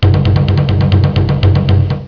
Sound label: Joy, happymusic; 特酷声音素材库; 背景音乐